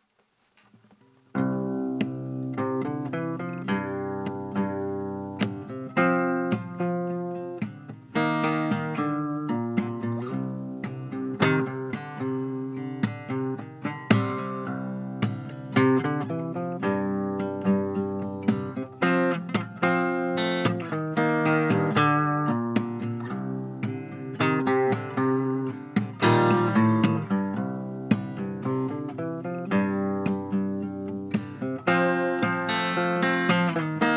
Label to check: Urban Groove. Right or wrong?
Right